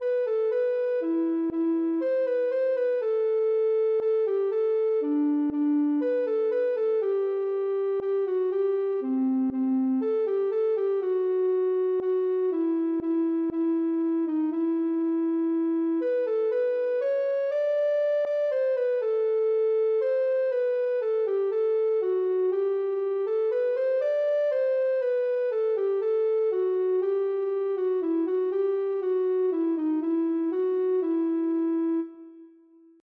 buba, ocarina

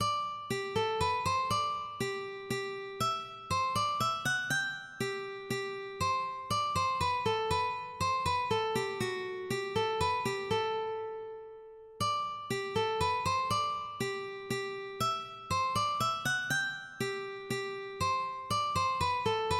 minuet, ukulele

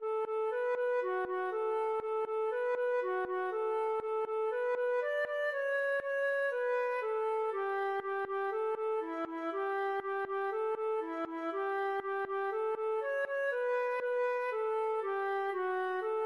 chipchip, feadóg

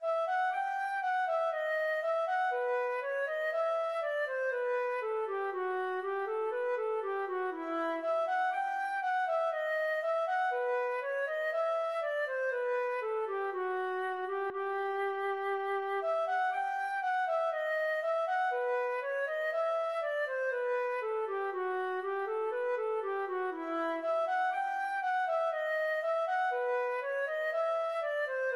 bourrée, feadóg; bwv0996

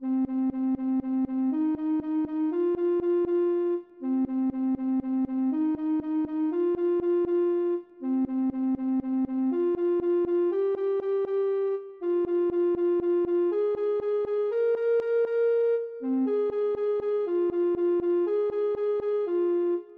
ocarina

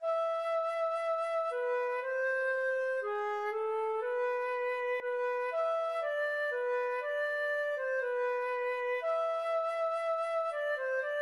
amarilli, feadóg

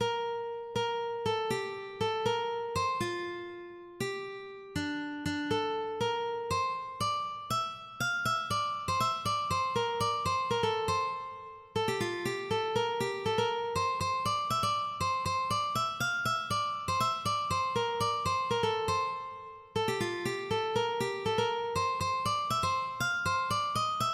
bubble, ukulele

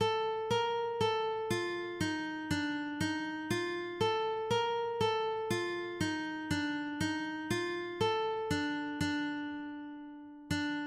elingeling, ukulele

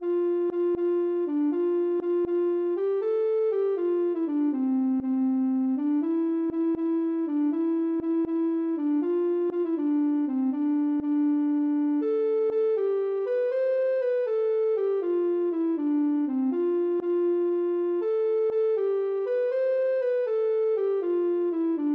cantiga 119, ocarina

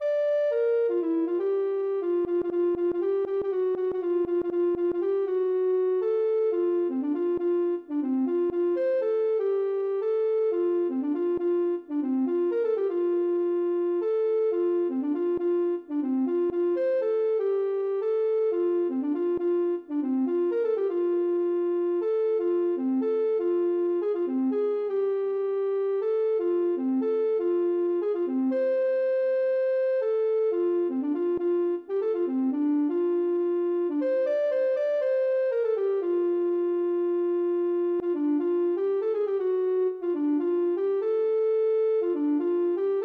ocarina

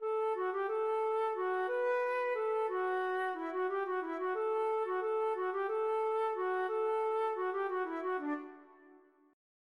nemašim, feadóg

